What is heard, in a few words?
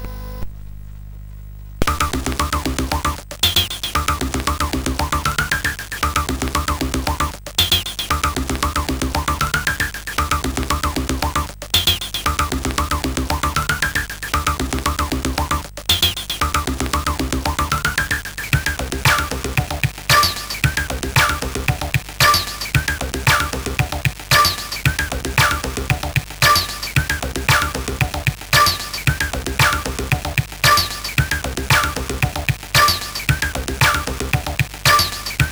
Sampled sid CSG8580R5